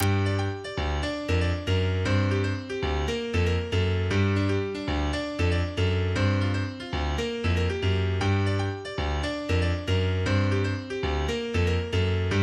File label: forest funk